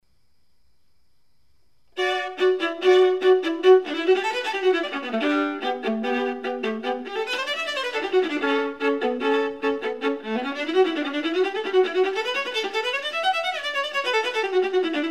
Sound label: Viola